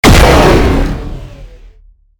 laser minigun charge shoot

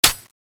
as50 clipin

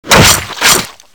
resident stab; cso

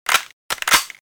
oicw foley

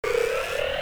railgun charge

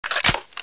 ak47 clip in, weapons

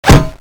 metal, weapons; thanatos3

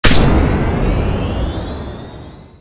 speedup; cso

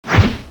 axe miss; skullaxe; weapons

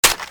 vulcanus reload; weapons

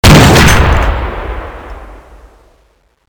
rainbowgun

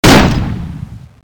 oicw

